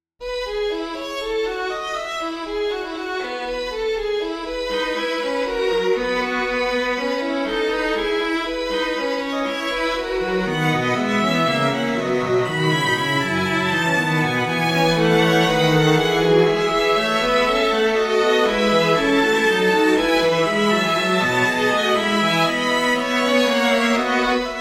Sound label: string quartet; Fugue major